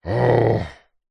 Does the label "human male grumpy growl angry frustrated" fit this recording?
Yes